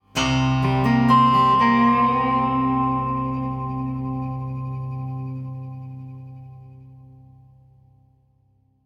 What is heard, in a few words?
ambience slide; dead or alive 2